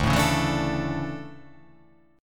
D Major 7th Suspended 2nd

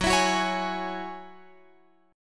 F sharp 7th Suspended 2nd, Guitar Open F; F#7sus2 strummed

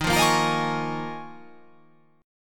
D sharp 7th Suspended 2nd Suspended 4th; D#7sus2sus4 strummed

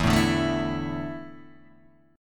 E 7th Suspended 4th, Guitar, DADGAD

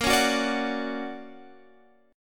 D Augmented Major 7th Bb, guitar

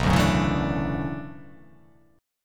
B Minor 11th B down

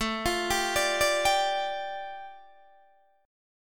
A 7th Suspended 4th Sharp 5th A, Guitar Open D; chord

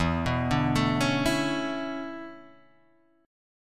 E 13th Suspended 4th; E134 Chord {0 2 0 2 2 0} arpeggiated

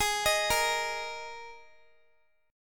Ab Suspended 2nd Guitar; arpeggiated; Absus2; x,x,20,20,22,x

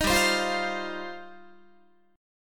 G 7th Suspended 4th Sharp 5th, Guitar; Listen to G7sus4#5 strummed; G7sus4#5 Chord

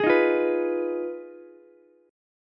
B 6th Flat 5th B down Ukulele; strummed

